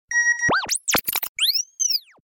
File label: Quick Sms